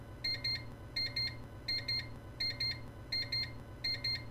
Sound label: Beeping Rapid Beep every half second; CyberPower